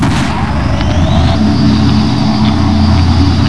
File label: start run; weapons; egon; XDM